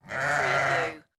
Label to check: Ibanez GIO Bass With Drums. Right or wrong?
Wrong